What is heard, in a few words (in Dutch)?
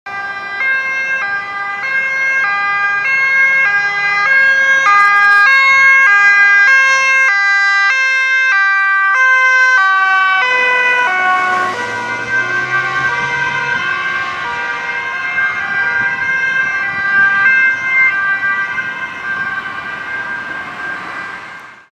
Politie met spoed nieuwe sirene